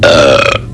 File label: burp